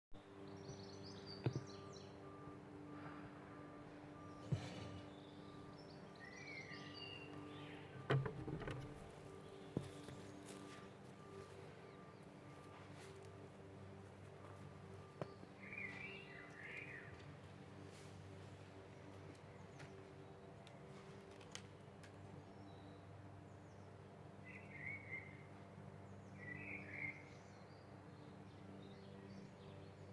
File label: beautiful birdsong in my garden as i hung out washing; on the last day of march